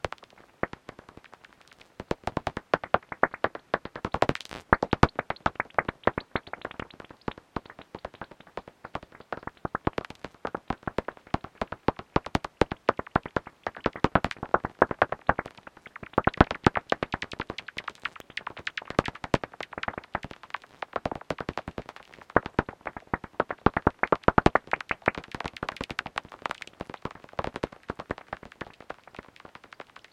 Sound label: Bats